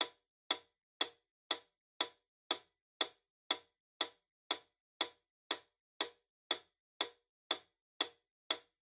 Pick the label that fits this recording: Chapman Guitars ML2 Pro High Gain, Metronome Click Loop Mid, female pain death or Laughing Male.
Metronome Click Loop Mid